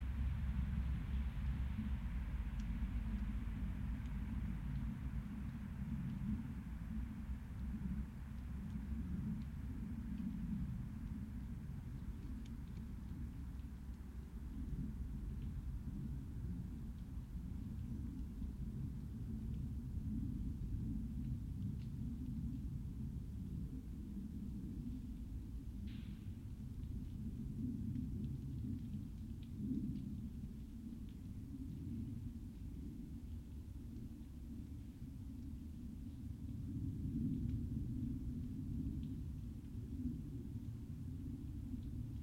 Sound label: yamanakako sound